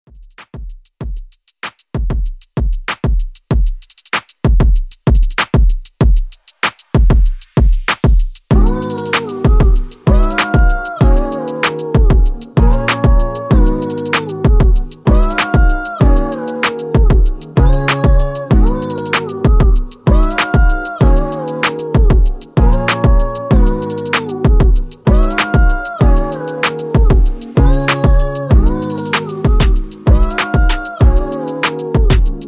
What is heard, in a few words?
Hip Hop; Redrum